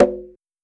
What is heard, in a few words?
Perc